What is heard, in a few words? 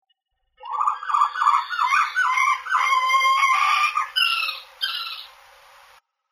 warble, owl